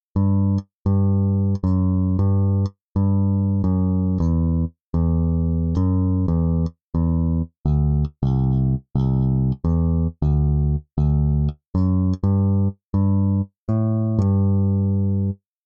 BASS bassman; MODO BASS; IK Multimedia

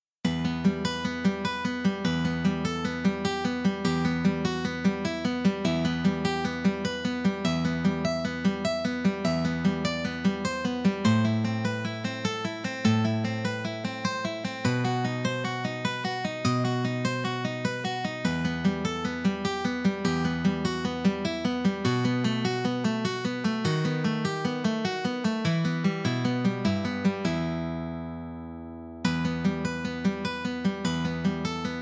strum; aas

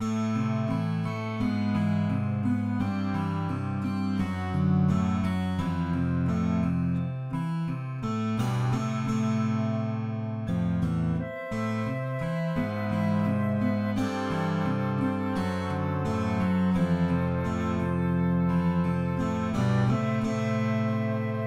Harp Guitar; Brad Hoyt's Harp Guitar; Soundiron

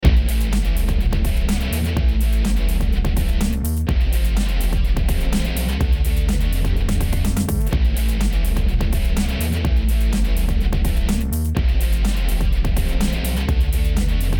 bigbeat mono

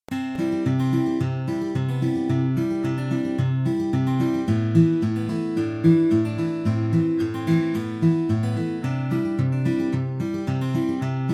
dust, poetic guitar

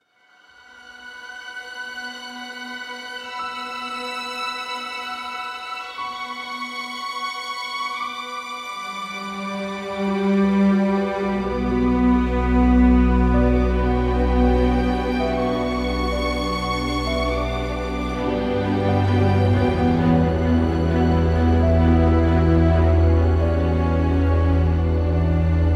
ORCHESTRAL STRINGS; LUX ORCHESTRAL STRINGS; sonuscore

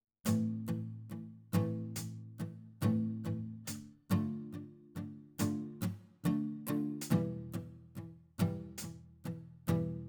STA Test Preamp; Audified; STA Effects Bundle

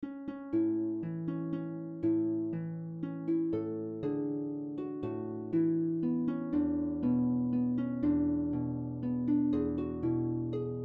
harp lullaby; konzert harfe; Pianoteq; Modartt

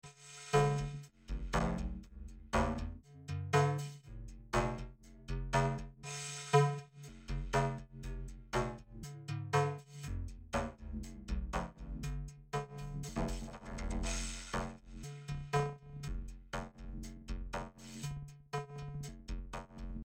vocoder drum

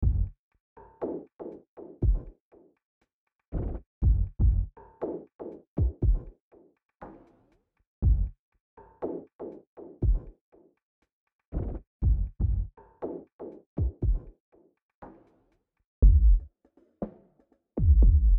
Drums; Softube

